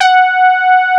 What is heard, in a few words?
SB HORN; Wizoo - Powered Nord; STEREO LAYER